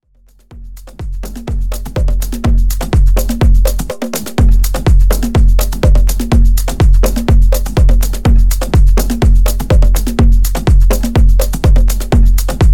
Tech latin House

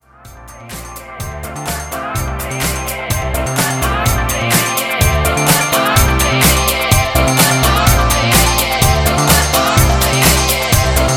Snippet 126bpm C

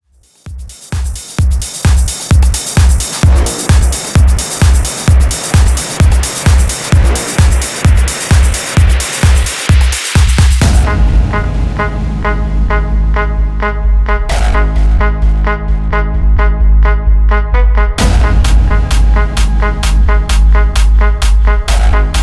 Party EDM 130 Bpm

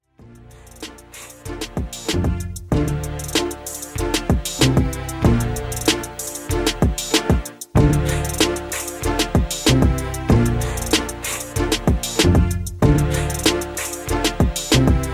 Hiphop 95Bpm

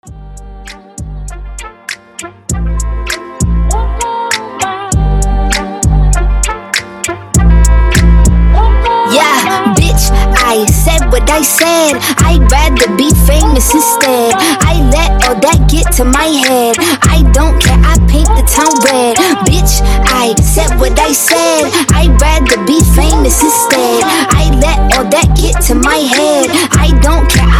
Wordplay Segue 99 BPM

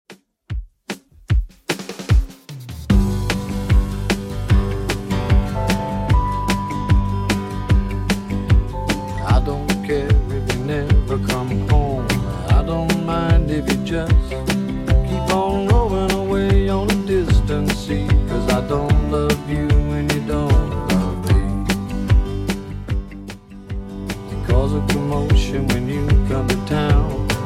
70s Redrum 150bpm

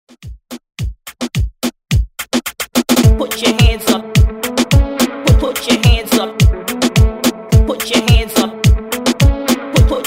Reggaeton Hype Intro

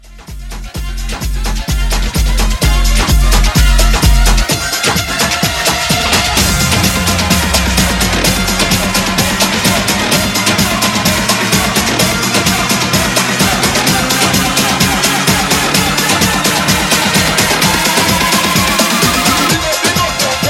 Big Horn Bootleg 128

